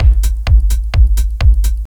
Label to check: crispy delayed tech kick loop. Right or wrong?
Right